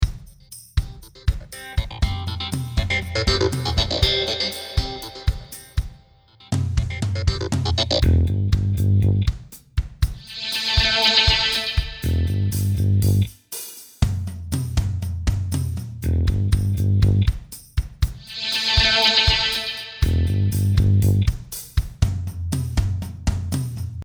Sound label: bass